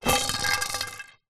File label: sport pool cue roll hard ground